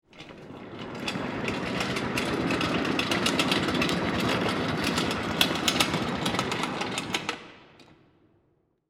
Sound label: Cart Roll By, Industry, Cart, Roll, By, In, Garage, Fast; Rolling; BlastWave